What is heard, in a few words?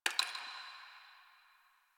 Ice Crack One Off